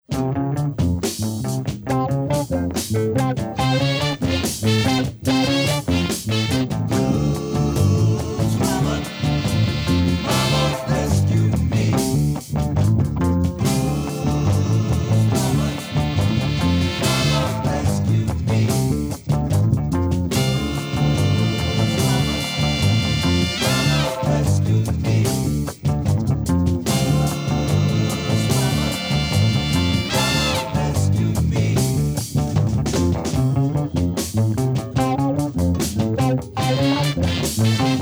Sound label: Blues